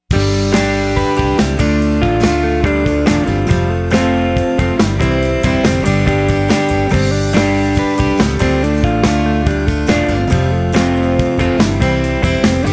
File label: Instrumental